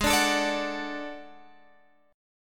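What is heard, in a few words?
D Major, A flat; D/Ab; strummed; JGuitar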